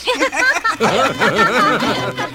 laugh group; ooh ahh

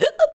hiccup

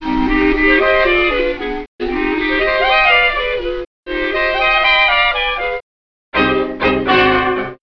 flute

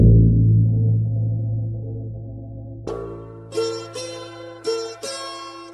guitar, Loops

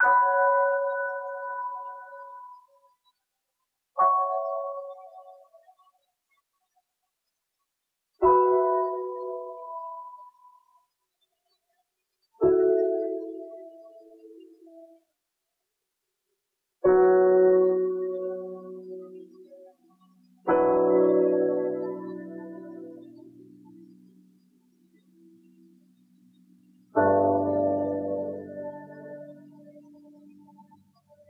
piano, sad

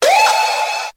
"woo", nongender; ooh ahh; ho hoo woo

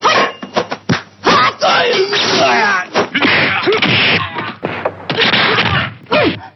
martial arts

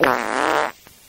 fart medium

